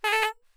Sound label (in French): KLAXON A MAIN